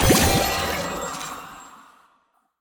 item heal; bloom minigame

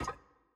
Monkey Stuck; Puzzle

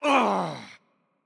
Hurt Heavy; VO; bloom minigame

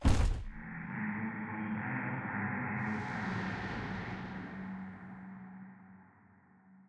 library puzzle open; volibear

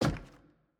Footstep Basement Wood Stairs

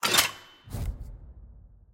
Monkey Pin; Puzzle

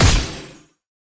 minigame SFX assist; Kayle; bloom minigame

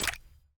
UI Click Exit; bloom minigame

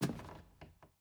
Footstep Wood Stairs

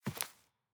Footstep Grass

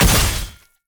Attack Jump; bloom minigame

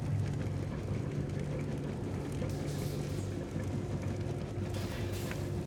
Generator

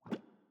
Bubble Large Blow

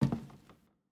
Footstep Wood

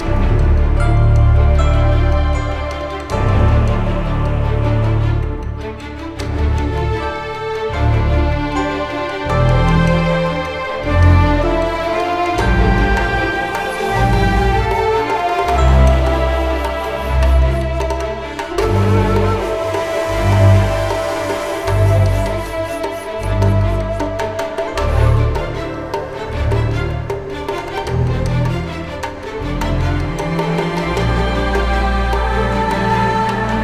Combat 155BPM

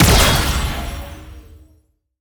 Attack Jump Heavy; bloom minigame

